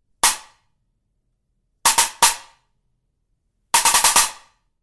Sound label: Stacker HiHat, cymbal sound